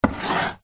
spice jar glass small set down then slide on wood shelf